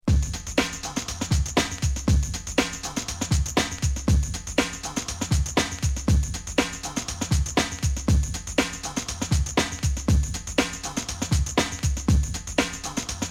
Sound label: beat